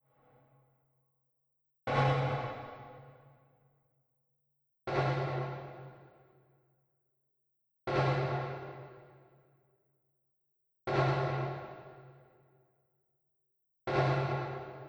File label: Zion Snare slap; Google Earth VR